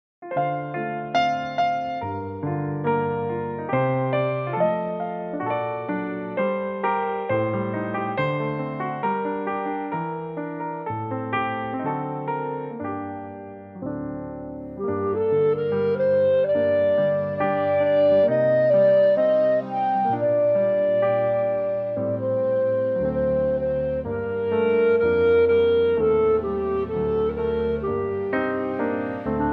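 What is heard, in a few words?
Gm play clarinet reference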